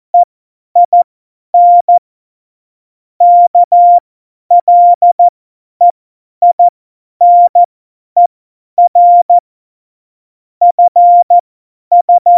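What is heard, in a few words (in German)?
Witz, cw morsen; Morsekurs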